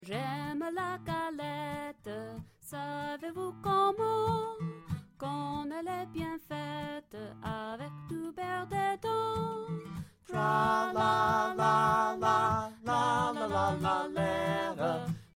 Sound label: j'aime la galette vocal; French